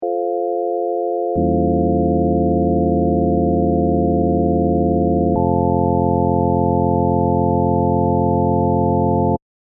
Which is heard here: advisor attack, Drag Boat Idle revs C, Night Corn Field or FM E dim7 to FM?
FM E dim7 to FM